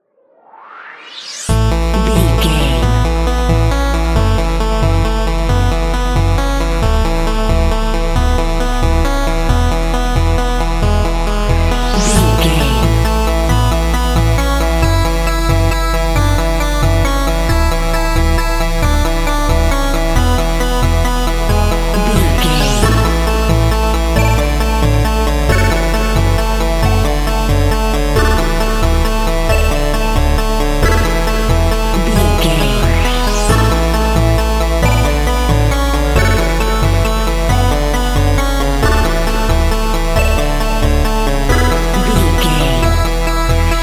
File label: beauty 8 bit